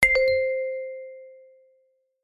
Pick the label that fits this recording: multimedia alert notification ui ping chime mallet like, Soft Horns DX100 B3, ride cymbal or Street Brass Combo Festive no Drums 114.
multimedia alert notification ui ping chime mallet like